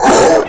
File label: pain, player; QUAKE2; cyborg